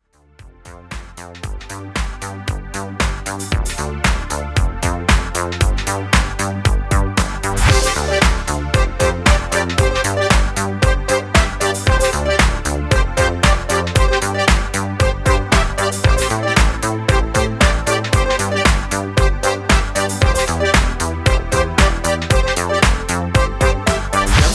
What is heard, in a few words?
80s New Wave ReDrum 115Bpm